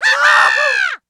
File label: Slingshot; Blue And Jewel